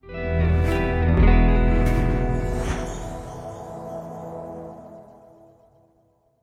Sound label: Completed; Rocksmith